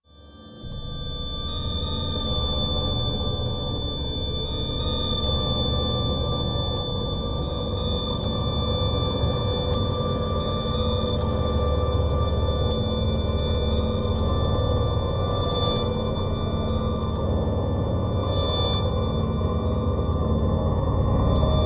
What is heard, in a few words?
Orchestral Compresso, sci fi ambience